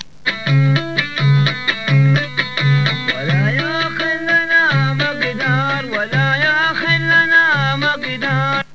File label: lyre